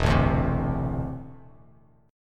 E 7th Suspended 4th Sharp 5th E down Bass Standard; strummed; chord; JGuitar